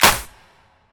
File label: long room reverb hand clap f sharp